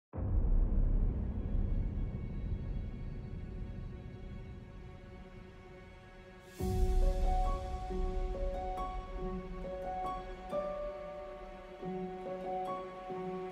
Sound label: Epic Heroic Trailer Music; Ghostwriter Music